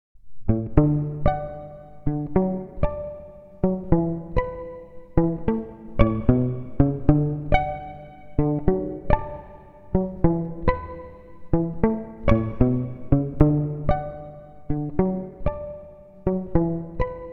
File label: groove; vanoce